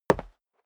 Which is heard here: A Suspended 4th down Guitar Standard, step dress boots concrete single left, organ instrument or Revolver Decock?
step dress boots concrete single left